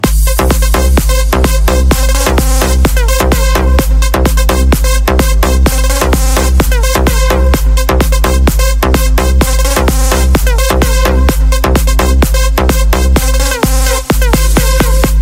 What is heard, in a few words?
dance ringtone